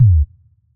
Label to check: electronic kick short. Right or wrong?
Right